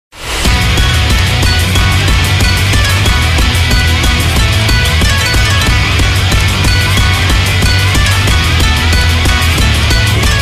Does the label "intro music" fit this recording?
Yes